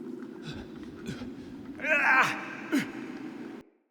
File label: Wake Up Vocalizations Male; Envoy; Cinematics; Bromius; Bromius Quest Wake Up Poppet